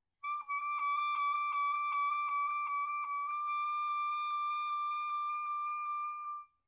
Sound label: trills e